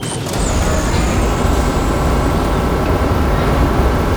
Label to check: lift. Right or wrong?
Right